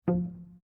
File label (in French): inventaire